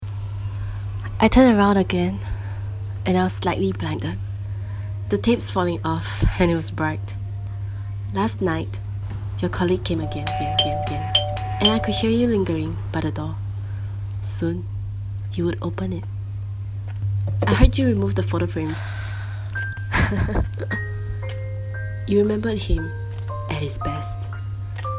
real with music

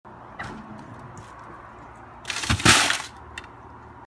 sound of home

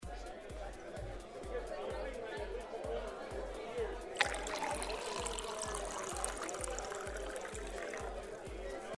pouring scene

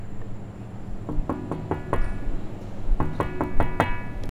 KNOCKING ON METAL DUSTBIN

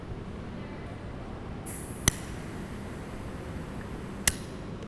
IGNITION OF LIGHTER

